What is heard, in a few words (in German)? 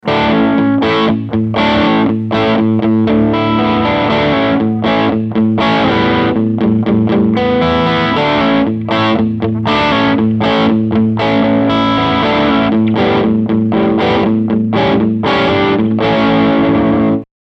mxr super badass distortion low gain eq 12 uhr